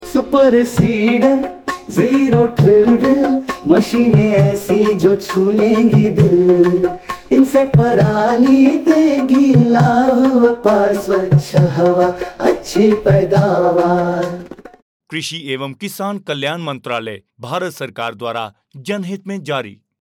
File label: hindi, jingles